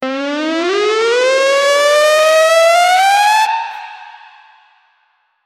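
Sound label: an emergency alert system alert sound for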